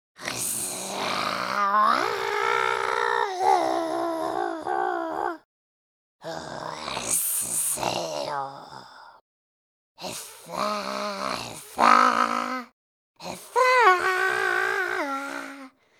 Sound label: Runt Sing